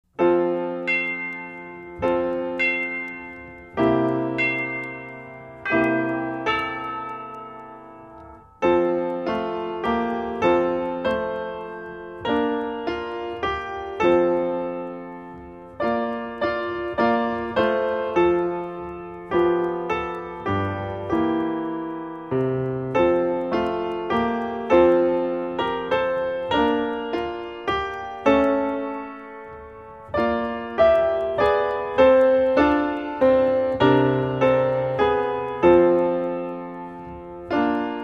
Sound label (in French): Chantes Enfants musique